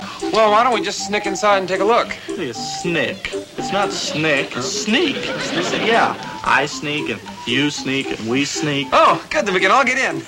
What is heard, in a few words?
snick